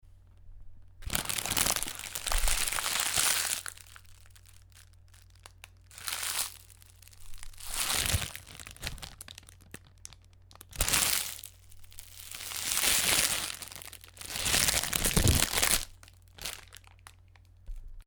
Plastic bag

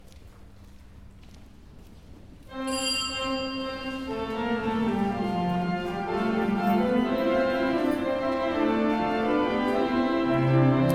mass entrance hymn organ

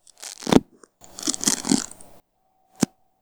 break spaghetti

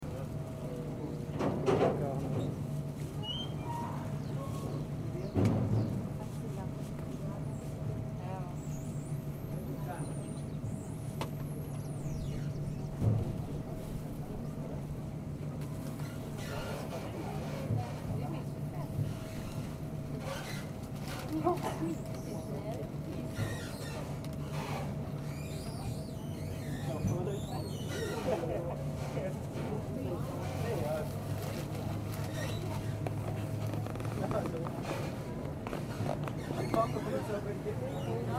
boat by a wharf

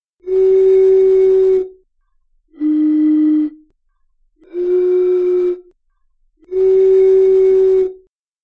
sounds of blown bottle

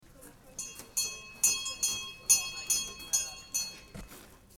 school bell

